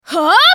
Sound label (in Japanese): 攻撃; カリュケ